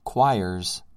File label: choirs